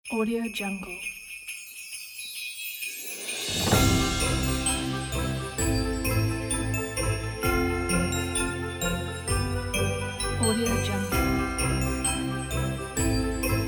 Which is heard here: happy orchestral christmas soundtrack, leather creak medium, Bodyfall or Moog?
happy orchestral christmas soundtrack